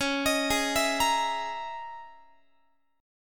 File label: C sharp Minor 6th Add 9th C sharp, Guitar; C#m6add9 arpeggiated